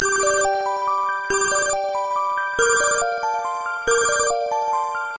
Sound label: Alarm Bellusion, alarmas